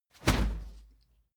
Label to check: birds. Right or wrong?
Wrong